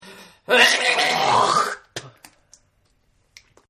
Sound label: Spit; Spitting; Human; Partners In Rhyme